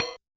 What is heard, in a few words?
Kit Hat, Realistic Concertmate-670; One Shot Kit